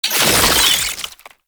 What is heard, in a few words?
Oraxia Brood Spider Spawn; Warframe